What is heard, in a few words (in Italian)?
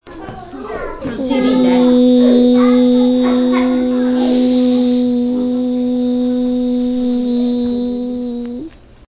ape, per fare questo suono ho fatto con la bocca un po' chiusa e si fa vicino ai denti